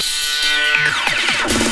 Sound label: Loops Fills; Vengeance Samples